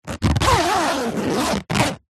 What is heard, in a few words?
tent zipper door compartment; foley; zapsplat